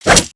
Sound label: Sword Slash; weapons; aronwk collection; ndaudio